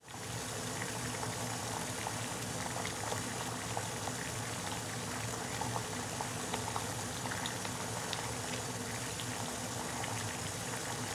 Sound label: Water Boiling